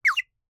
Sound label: Birdwhistle, clay, painted, water, airy, wispy, clear, solo chirp; Eiravaein Works; Vaeyan IV